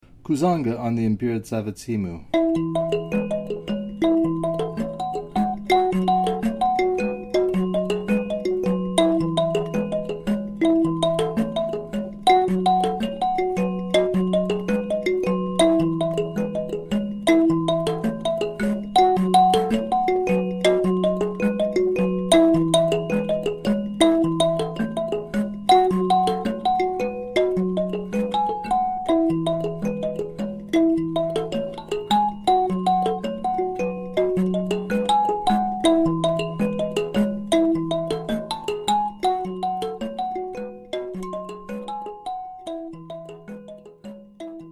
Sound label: Kuzanga Basic Var1, kalimba